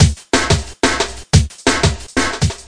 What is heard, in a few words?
180, drum loops and breaks; 161 to 180 bpm